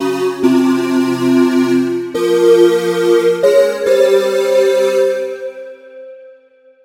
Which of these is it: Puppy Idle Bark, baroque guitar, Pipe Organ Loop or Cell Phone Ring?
Pipe Organ Loop